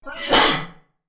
Sneezing

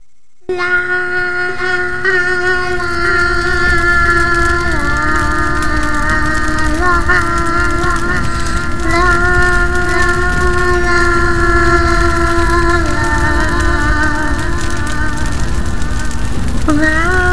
Little Girl Singing

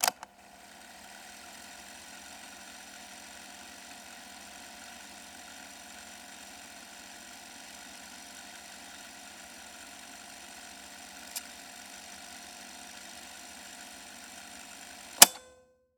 Cassette On And Off

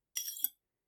Sword Draw

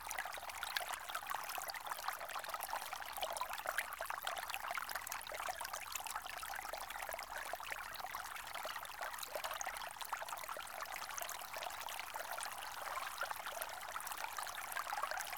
Ambiance Stream Calm Loop Stereo

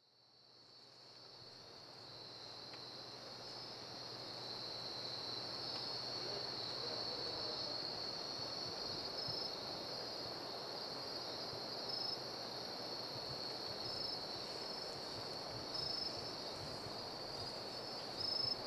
Natural Night Sounds In Boquete

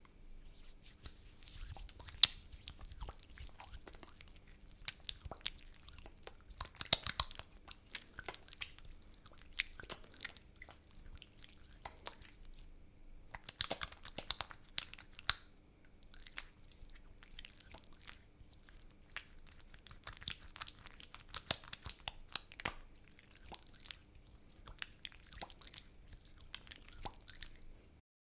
Rubbing Hands With Lotion

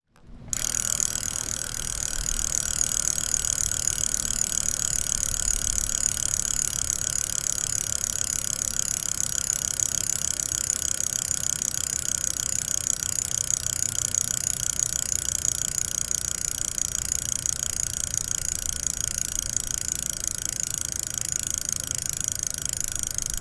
Bike Wheel Spinning

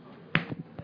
Punch Impact Sound

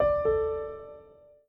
Piano Notification

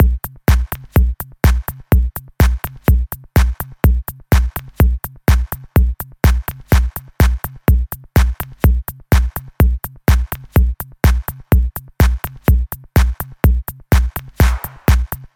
125 Bpm Micro Digital Funk

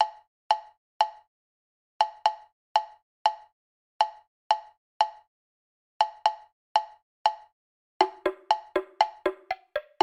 Bongo Comedy Background Music 60bpm

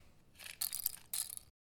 Falling Matches

